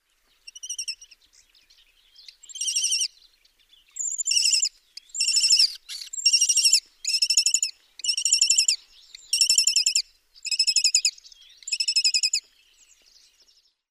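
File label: Lesser kestrel, bird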